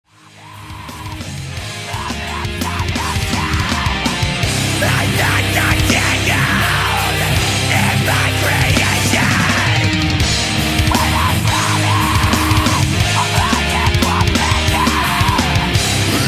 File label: closed casket requiem